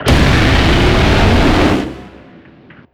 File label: brimstone lazer big